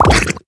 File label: deactivated